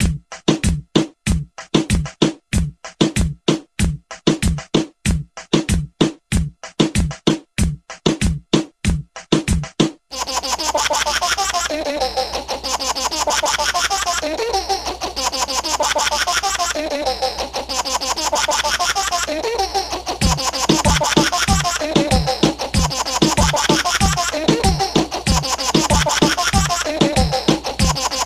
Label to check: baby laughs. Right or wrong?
Right